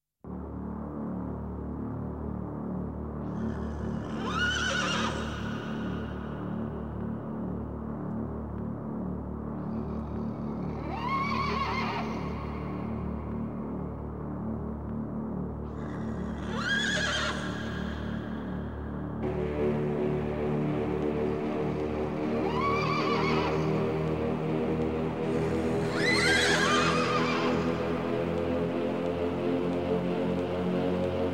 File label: Jingle